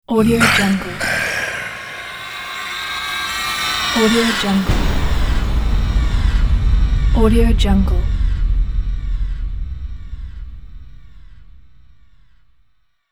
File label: nightmare stinger